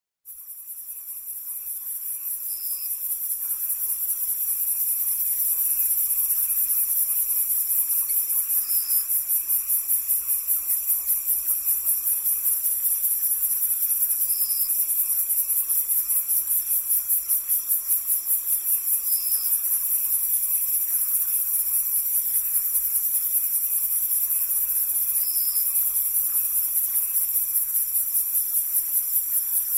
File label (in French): Son de grillons crickets et grenouille